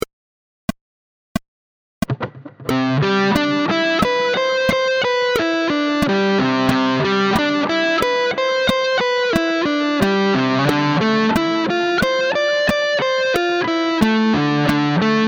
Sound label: moodit 6 string pattern